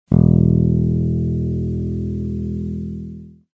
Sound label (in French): basse e, accordeur